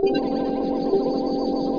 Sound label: locate; machines